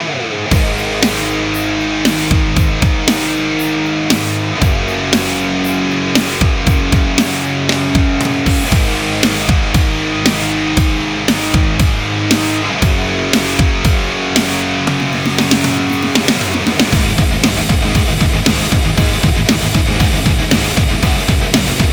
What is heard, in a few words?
Instrumental Beatbox Metal Remix; Morshu